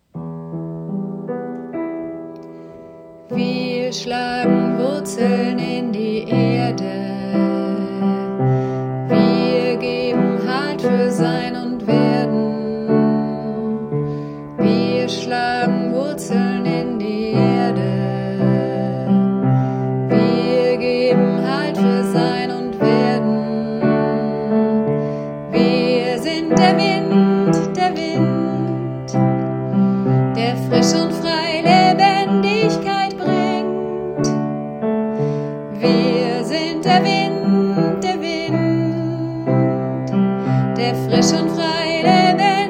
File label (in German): Wir schlagen Wurzeln